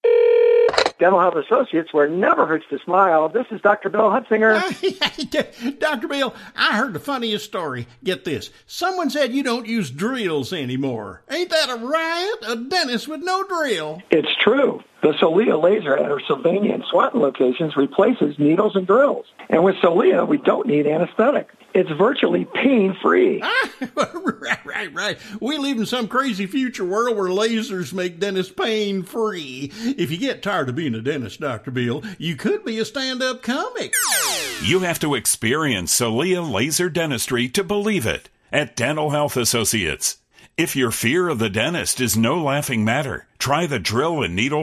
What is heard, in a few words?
LAUGHING